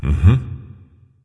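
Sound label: non verbal agree, voice; cernunnos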